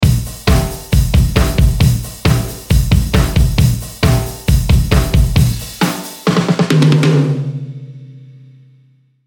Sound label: drum pattern